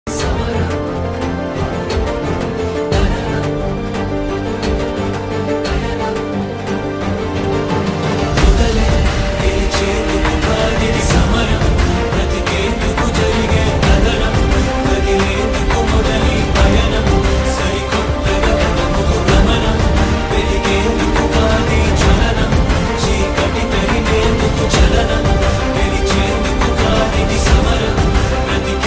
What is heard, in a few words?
motivational bgm; jersey